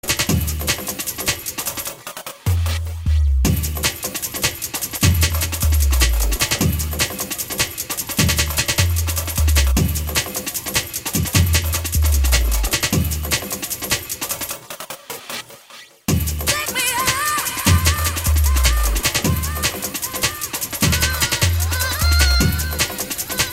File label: drum and bass; RECORDED RADIO